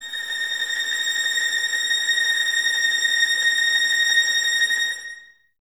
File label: VS A#6 Tremolo, 11 Violins; Miroslav Vitous